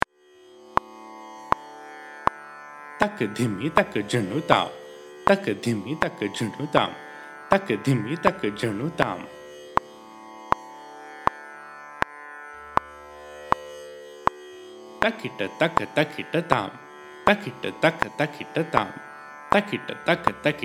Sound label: Carnatic Violin Reading Skill; Grade 2; SaPa